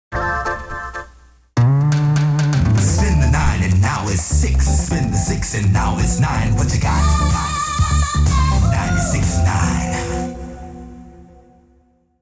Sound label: Jingle, radio; 1992